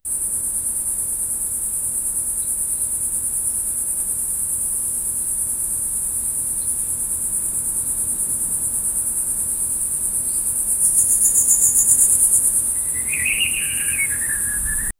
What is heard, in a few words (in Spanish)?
ambiente sonoro misterios, mountain